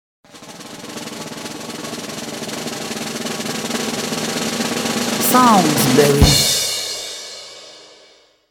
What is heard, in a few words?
Announcement